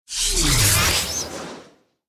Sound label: Portal Closing